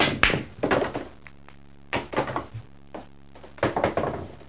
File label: Pool Ball Break